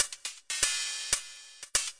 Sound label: bright electro cymbals, 120 bpm